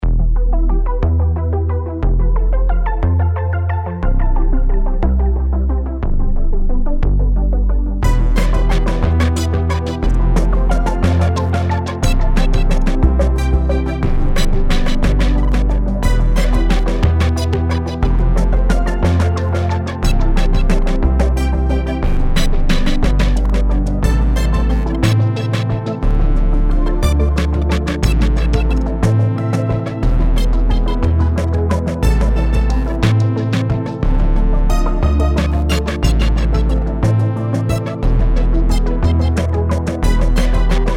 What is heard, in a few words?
Landing, Electronic music; pack07 electronicmusic issue01